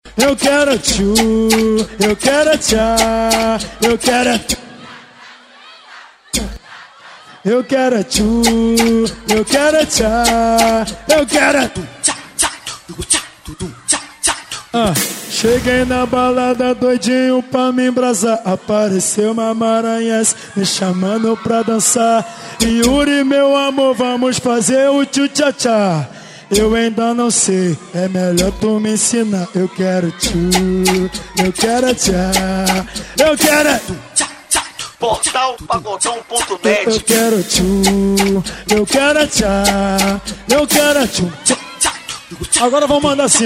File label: funk